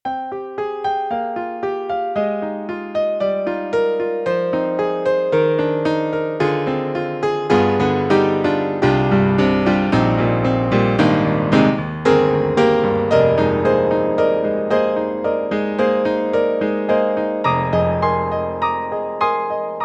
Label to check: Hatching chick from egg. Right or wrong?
Wrong